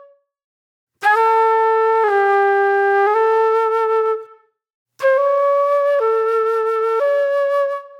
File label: Reflective Flute